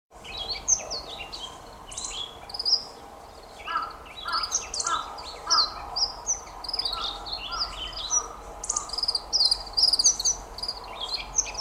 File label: kashiradaka song